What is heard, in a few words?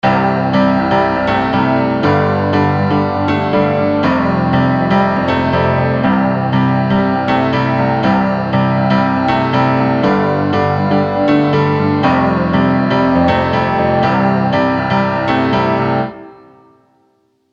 Upright Piano Synth Pop 70s Epic; EZkeys